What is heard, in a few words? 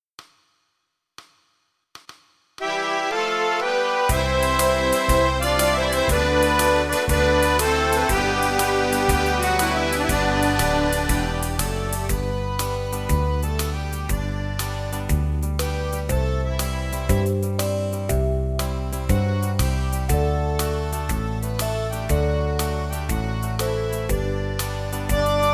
Party Song Happy Anniversary; backing track